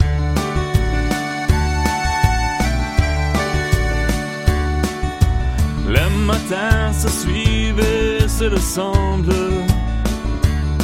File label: french song